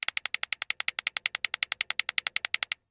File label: Xylophone rosewood roll ff C8 stereo; MIS Pitches - 2014; Percussion